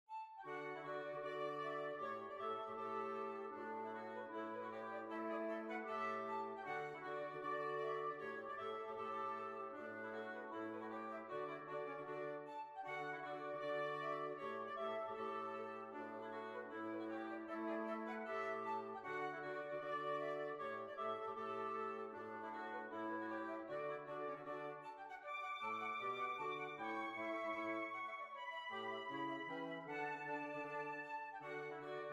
Hornpipe

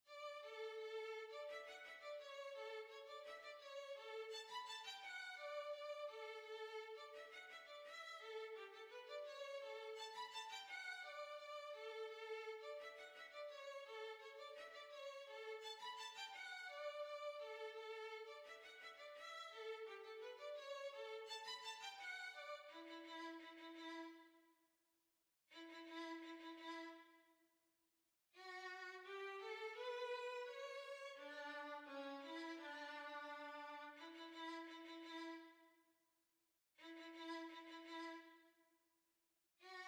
Violin; Argiers

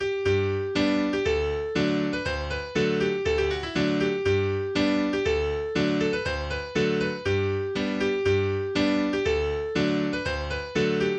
German Boor Dance, english dance tunes; eighty english dance tunes

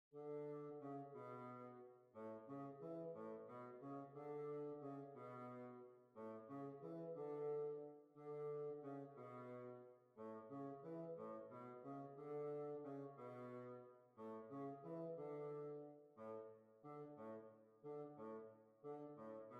Swiss Waltz; Swiss Waltz II

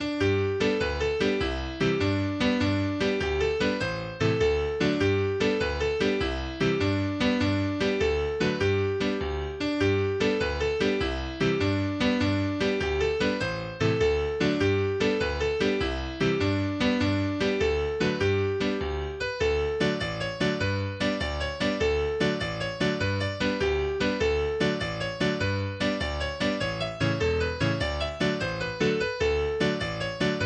Woodbine Cottage, english dance tunes; eighty english dance tunes

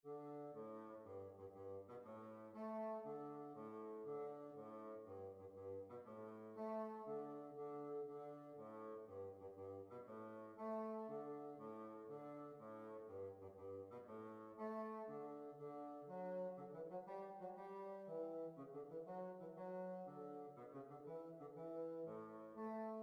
Jig

